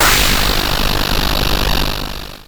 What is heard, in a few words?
Electron Sidstation, Drum Machines